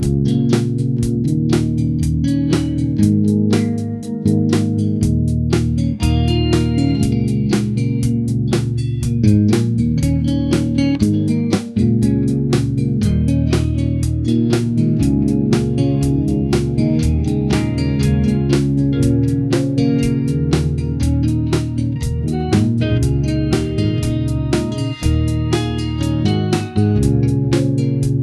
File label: funky guitar; 47 second